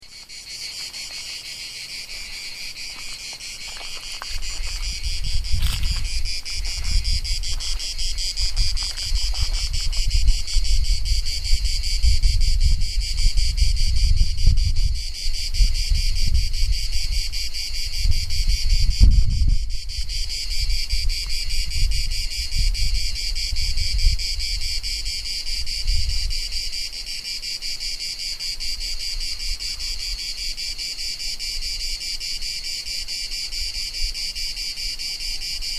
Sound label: electronic cicadas